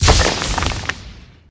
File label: Meteo bomb